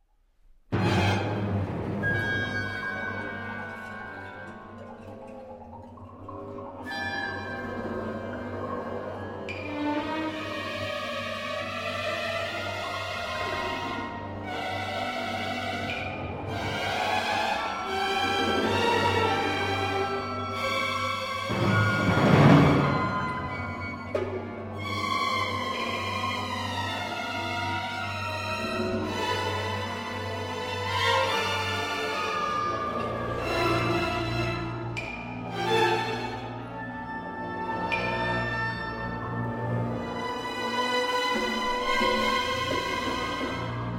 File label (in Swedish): Musik vid ett berg